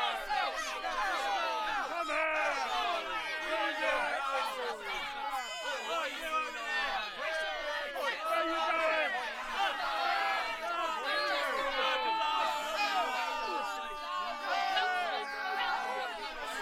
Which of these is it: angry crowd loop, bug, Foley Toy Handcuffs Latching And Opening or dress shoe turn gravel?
angry crowd loop